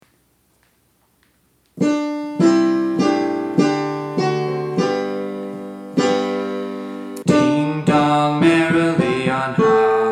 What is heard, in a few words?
Alto